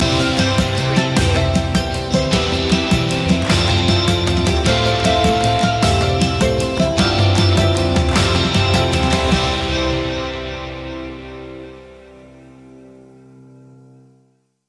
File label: shining through no vocals